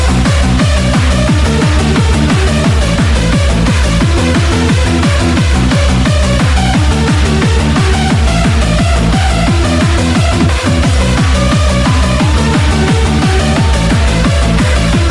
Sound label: hardcore trance